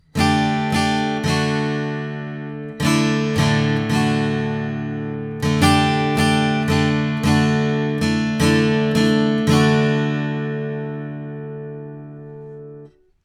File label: Guitar Twin87 vintage